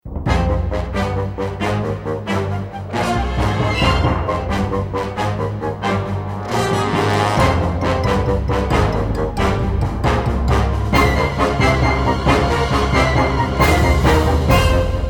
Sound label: Fight Sequence